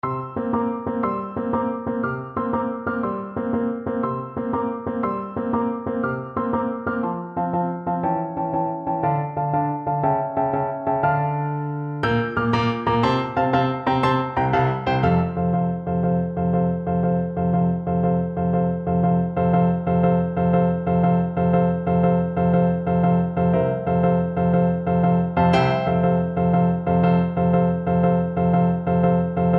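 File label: recorder karaoke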